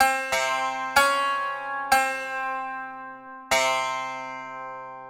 Tzoura, 094 Cmin; Hip Hop Exotica; Apple Loops; Big Fish Audio

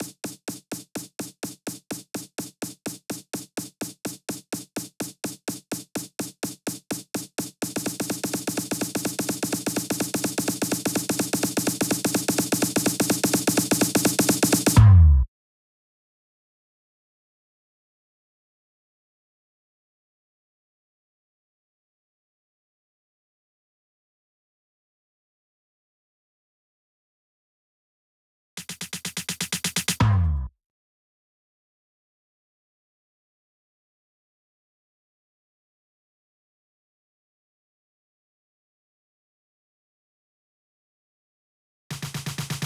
Drum Fills, Future House 126 BPM F; Big EDM; Future House Tools; Construction Kits; Snow

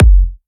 EDM Future Kick

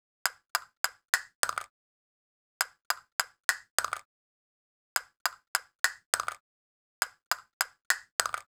Castanet, 102 Fmin

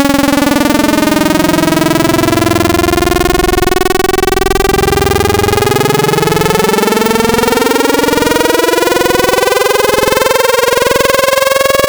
Saw Formants; Cymatics; Wavetables Collection Vol 3; Serum

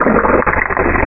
Lofi Barf; Cymatics; Vomit Wavetables; Serum

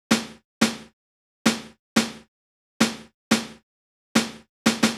Snare, Drum Tracks, Amin; Hip Hop; big fish audio; Hip Hop Exotica; Apple Loops

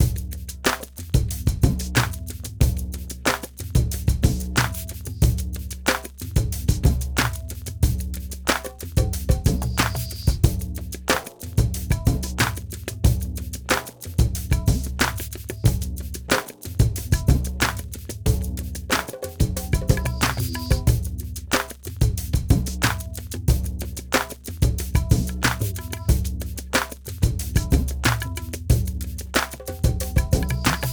mix 092, Hip Hop India 092bpm; Hip Hop India Modern Percussion

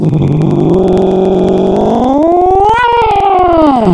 Cat, Wavetables; Cymatics; Serum; Animals for Serum - Gold Edition

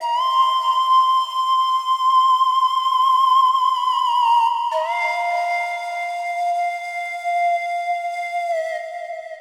Pan Flute, Fmin; Big Fish Audio

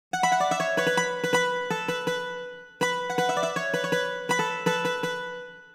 Bouzouki, 081 Bmin

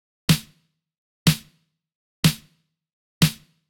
Big EDM Clap Loop, 123 BPM; Future House Tools; Big EDM Drum Loops

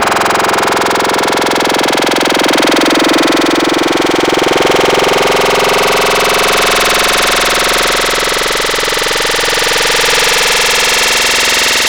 Full Vomit; Wavetables; Cymatics; Vomit for Serum Vol 2

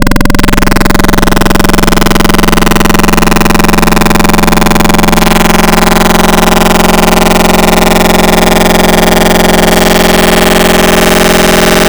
Lofi Screams, FM Wavetables; Cymatics; FM Wavetables Vol 3; Serum

